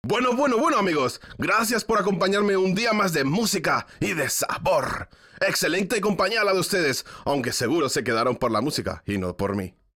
Music Vehicle Radio Events

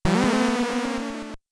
Cry; insmouse no yakata; virtual boy

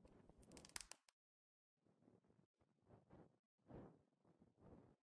Torch Details; Frozen Flame; windows

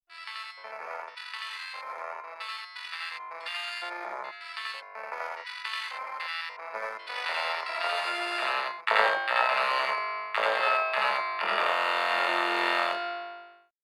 Ice Cream Truck; Transformers: Revenge of the Fallen

